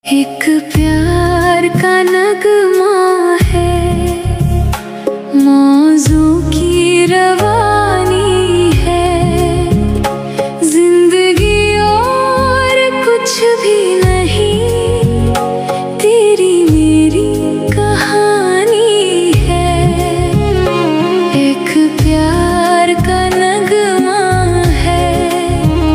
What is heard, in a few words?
AI Female Ringtone